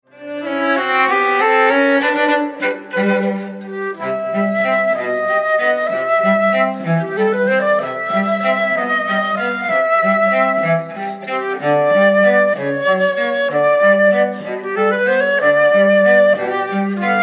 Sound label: Flute Cello Waltz